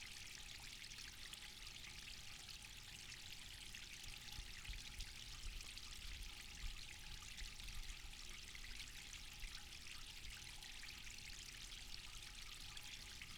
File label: ME66 Water